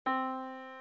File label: Yamaha Disk, piano sound